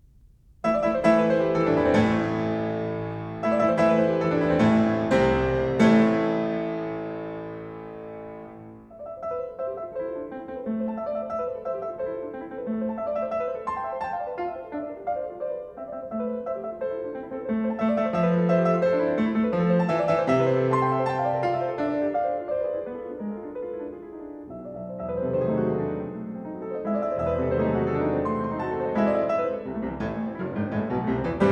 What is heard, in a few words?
Preludes for piano, Prelude in A minor; Rachmaninov; 24 Preludes